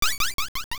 Laugh; Twinrova lauging; Oracle

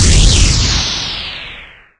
Hit Magic

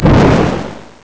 Flame Lantern On; Turn the Flame Lantern on and off; MC

